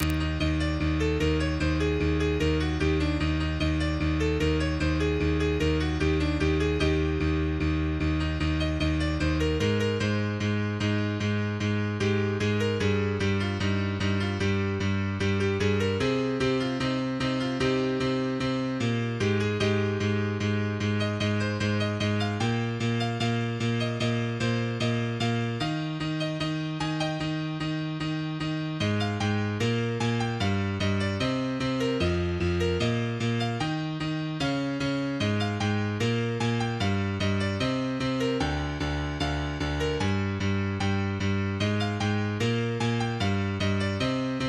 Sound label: White noise, MIDI